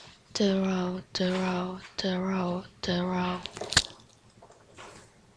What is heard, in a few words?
throw